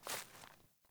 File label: Boot Walk Veg, Footsteps; Characters; Enemy; Melee Grunt; Boots; Sinecure